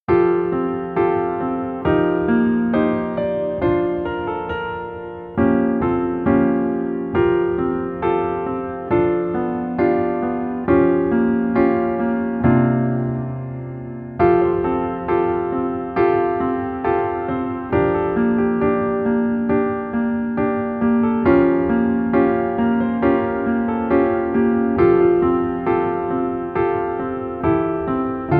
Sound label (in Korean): Piano ver 멜로디MR 여자키